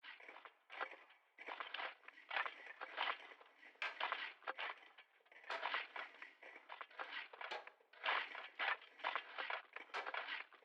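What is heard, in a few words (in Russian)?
лопатами копают на расстоянии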